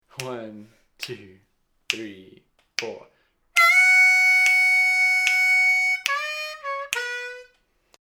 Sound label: Lick, harmonica